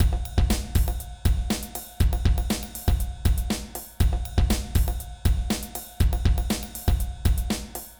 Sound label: Fast Release